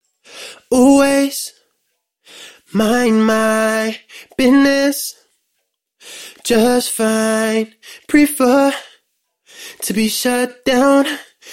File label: pop 130 AM